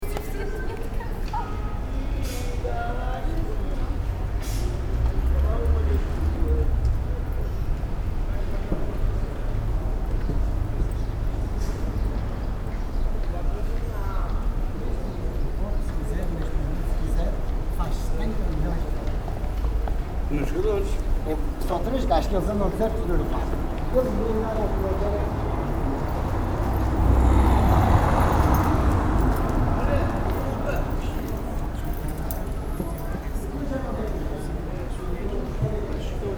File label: lisbon flute